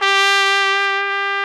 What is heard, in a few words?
SOLI TRP, Alpine Volksmusik, TROMPETEN; Best Service